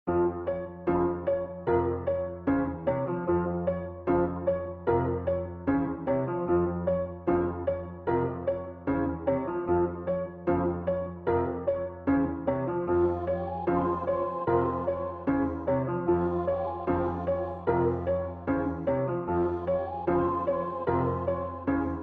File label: F min 150 BPM Piano Keys Vocal